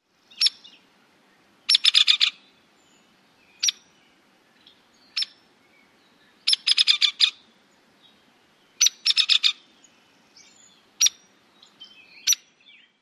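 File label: tweeks and sputters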